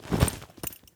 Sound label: Foley Soldier Gear Equipment Metal Cloth Heavy Movement Light; Legend of Keepers